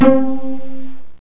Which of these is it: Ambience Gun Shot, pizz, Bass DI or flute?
pizz